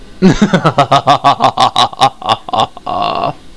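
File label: LAUGH MALE; HUMOR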